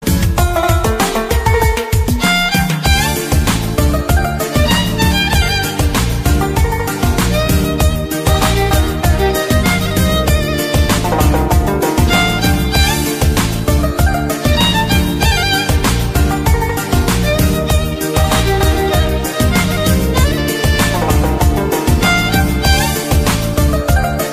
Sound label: Ringtone Arabic